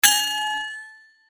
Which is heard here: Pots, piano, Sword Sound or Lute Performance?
Sword Sound